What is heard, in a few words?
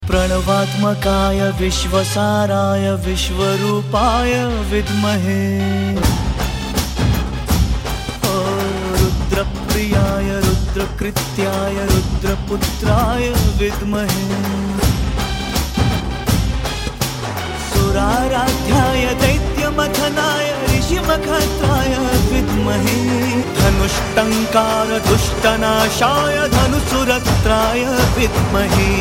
Ganesh Mahamantra